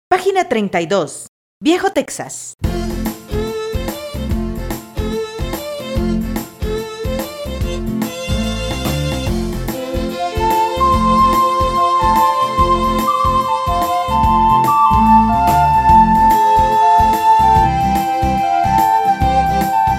VIEJO TEXAS CON FLAUTA

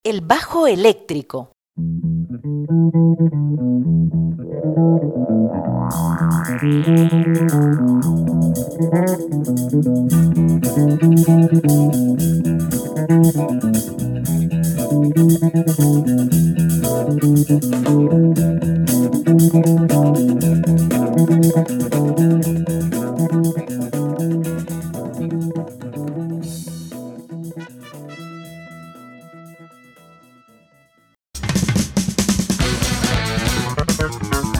Electrofonos Bajo electrico

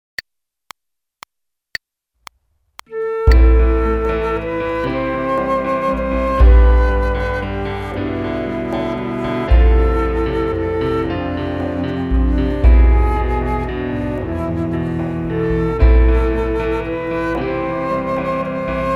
Greensleeves Segunda voz Flauta

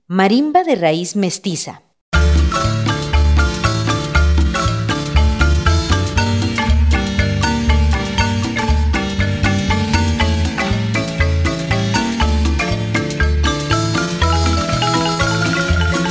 Marimba mestiza

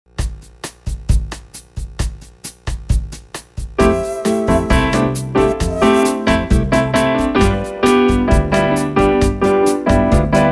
SIN FLAUTA

